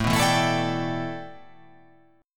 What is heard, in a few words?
A 7th Suspended 2nd Suspended 4th